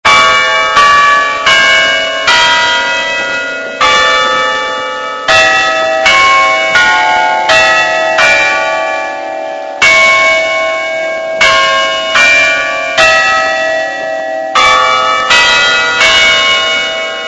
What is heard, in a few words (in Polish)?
carillony